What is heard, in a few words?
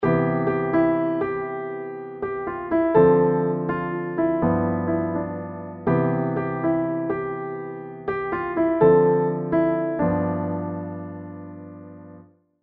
Piano C